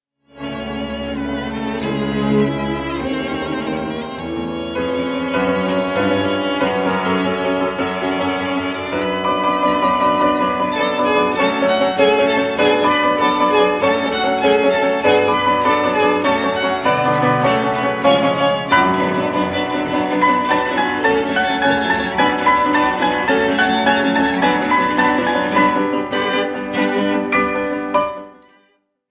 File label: trio in E flat major for piano and strings andante con moto; d929